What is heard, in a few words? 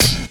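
snare drum, Drum & Bass, 140 BPM; Ueberschall; Drum & Bass Resonance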